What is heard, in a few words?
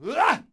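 Knight Attack Vox; Civ4 beyond sword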